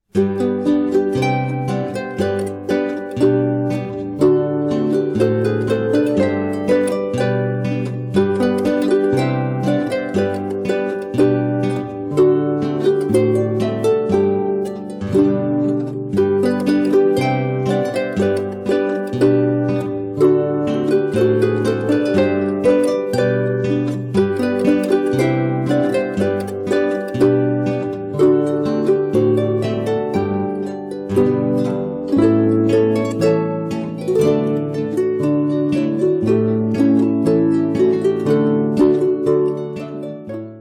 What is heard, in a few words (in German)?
Boarischer